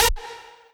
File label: lead